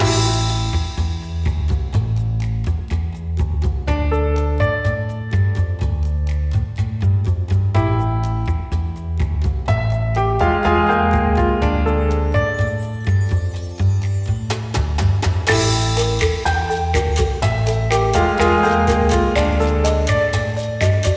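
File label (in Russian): мелодия в стиле мамбо; музыка для подложки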